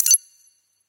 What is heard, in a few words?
reels stop; Magic Stars 5; Wazdan